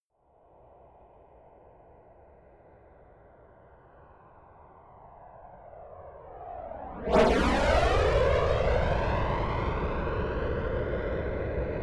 military jet f-22 raptor flyby left to right close